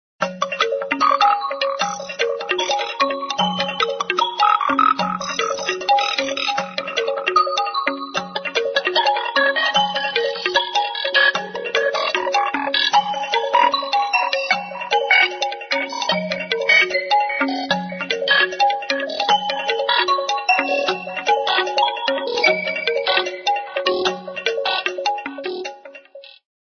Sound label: fire fly ringtone